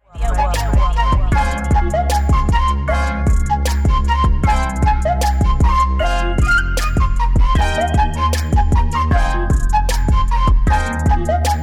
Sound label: crying flute